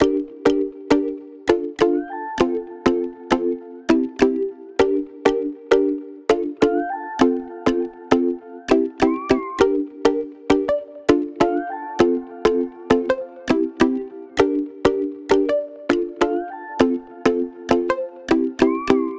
songstarter 100 Am, Pop; Slate; SD PASADENA Pop Sample Pack

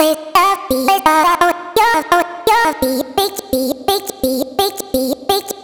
Mellow vocal chop, 85, Cm; Slate Digital Omnivox Vocal Sample Pack; Chops

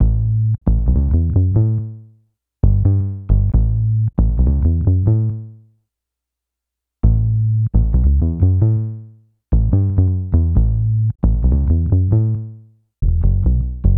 Synth loop 68 A, Synth Bass Loops; Slate Digital BASSHOLE Sample Pack

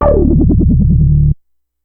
Synth oneshot LFO D, Synth Bass; Synth Bass One Shots; Slate Digital BASSHOLE Sample Pack

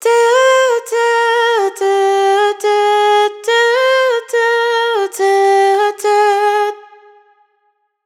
riff Do Do 140 Ab wet, Vocal; Slate Digital Omnivox Vocal Sample Pack

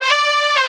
vocal power D; SD AURORA Sample Pack; Slate

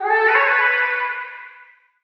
vocal searching A; SD AURORA Sample Pack; Slate

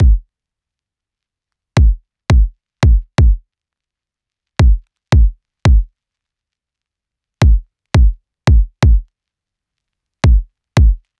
kick loop 85; Pop; Drums; Loops; Slate; SD PASADENA Pop Sample Pack

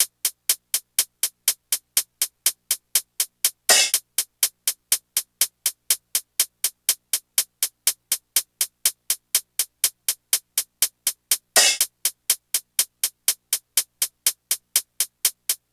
hat 122, Hat Loops; Drum Loops; SD MIDNIGHT Sample Pack; Slate

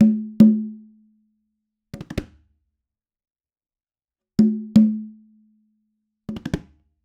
latin conga loop, 110; perc construction loops; SEISMIC Drum Sample Pack; Slate

